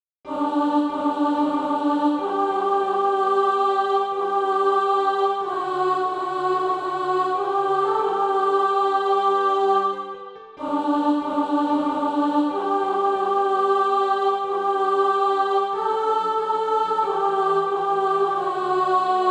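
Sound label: alto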